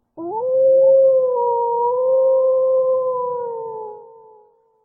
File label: alert song; vuk samotnjak